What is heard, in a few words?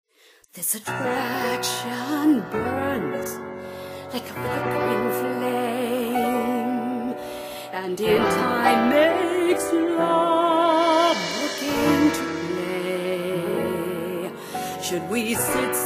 Go Slow lofi